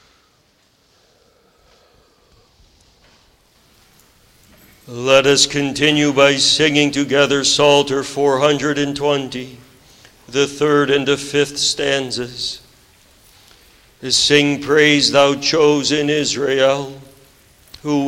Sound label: Singing; The Lord's Crowning Goodness; psalm 65 11